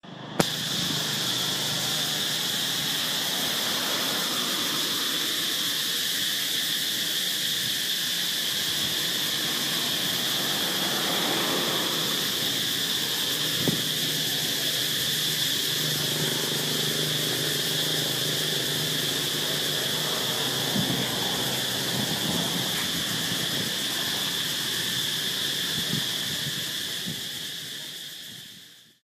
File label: angry criquets; Cambo